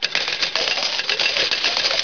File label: coin out, casino